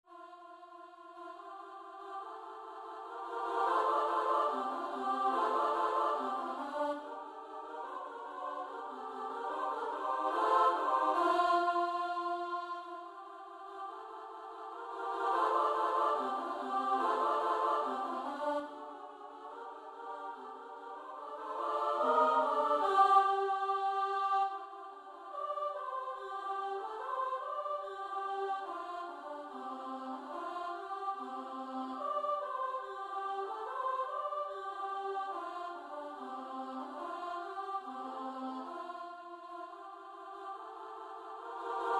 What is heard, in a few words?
midi; twardowski; idzie chmura